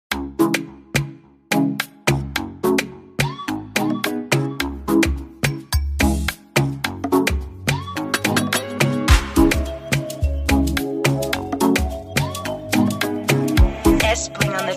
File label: Afro Beat Instrumental